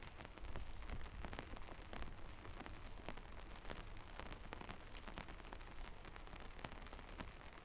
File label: rain umbrella; 99Sounds; Rain And Thunder